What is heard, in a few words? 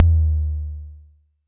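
vermona drm1 mk3 bass drum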